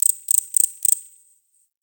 Forest Close Insect Chirp; Warframe; Grineer Forest

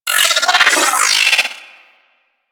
Vocal Attack, Enemies; Warframe; Riot Moa

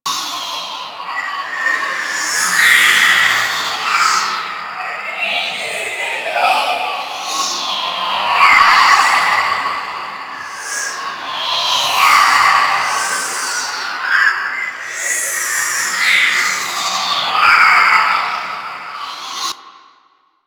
Moon Spirit Loop; Warframe; Ambience; Orokin

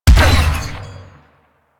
Artillery Shot; Warframe; Vehicles; NechroMech; Abilities

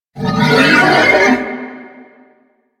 Horse Vocal Screech; Duviri Horse; Warframe

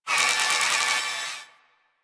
Crp Ship Rolo Dex Swipe A; Ambience; Props; Warframe; Corpus Ship Remaster

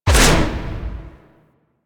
Melee Heavy Attack Short Whoosh; Warframe; Weapons; Whooshes

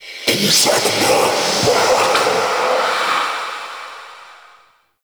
Male Vocal Enemy Spotted; Characters; Vocals; Mockeries

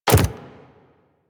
Land Default, Foley Footsteps, Pets; Warframe; Zanuka; Zanuka Companion A

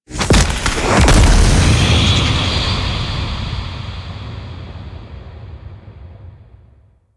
Ship Appear; Wraith; Warframe; Quests

